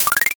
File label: board flip